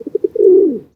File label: rock dove, bird calls